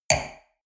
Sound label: wine glass place table medium short; berufe; soundpool